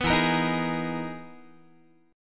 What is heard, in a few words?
E Major Sharp 11th E down Guitar; strummed; 14,12,13,13,12,x